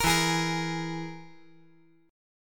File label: E Major Flat 5th